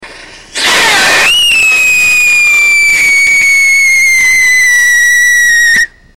potassium benzoate manganese dioxide, rockets whistle tests; Core Burner